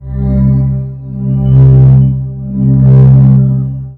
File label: anvil ambient; Fate Undiscovered Realms; ITEMS